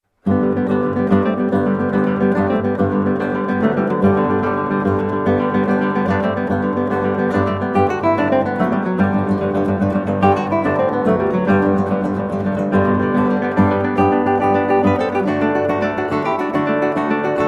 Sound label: Concerto in G Major Presto, spoken, 8 String Guitar; Baroque Concertos; RV151; Antonio Vivaldi